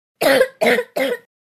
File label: Child coughing 7 year old